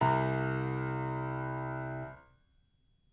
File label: piano; SDIF; ICMC2000